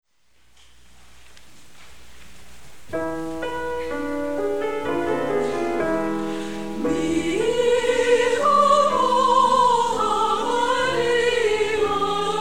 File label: Choir; Mi Chamocha